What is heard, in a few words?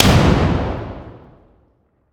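weapon sniper fire player atmo int; gmod; viper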